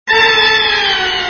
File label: sound effect